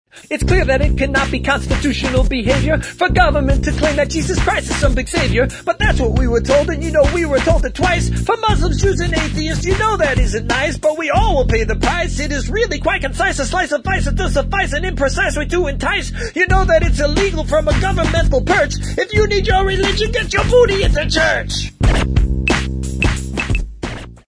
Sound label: rap sample